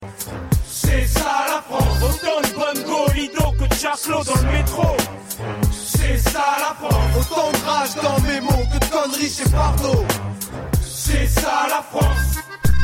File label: french christmas; ringtones